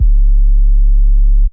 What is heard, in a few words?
Sub, Hip Hop Philosophy